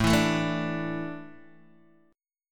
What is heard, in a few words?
A Suspended 4th, Guitar Open D